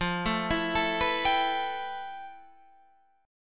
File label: F Major Sharp 11th, Guitar, Standard; arpeggiated; JGuitar